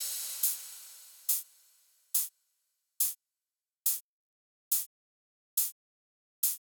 Riddim Drum Loop 140 Top

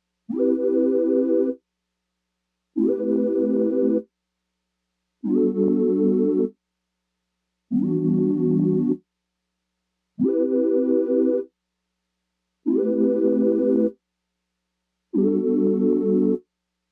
97 BPM E Min Chorus Sine, Loop Stems; Melodies; Cymatics; 2022 Melody Mystery Expansion; Sleepless Night

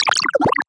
FX Aquabot; Riddim; One-Shots; SFX; Ghosthack; Riddim Essentials Volume 2

